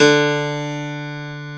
PF, GRAND PIANO; AKAI S6000 CD-ROM - Volume 1

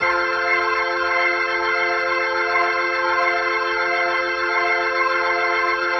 Progression, Organ, Loops

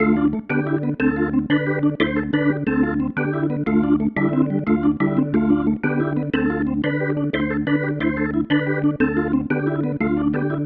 Pattern, Organ

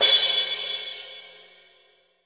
cymbal, Crash Cymbal, mono crash; AKAI S6000 CD-ROM - Volume 3

